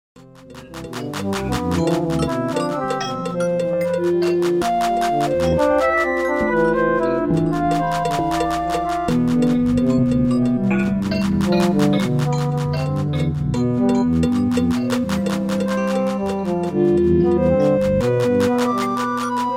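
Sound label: african style complex polyrhythm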